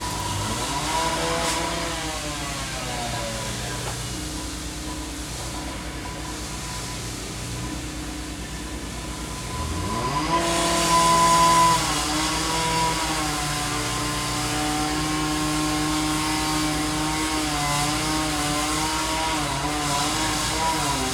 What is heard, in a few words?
Construction, UK Construction Ambience; InMotionAudio